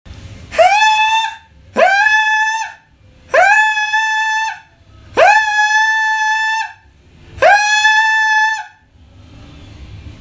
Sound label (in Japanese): 裏声 A5